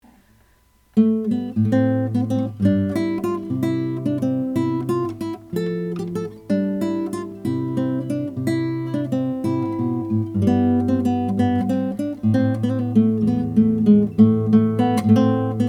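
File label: classical, guitar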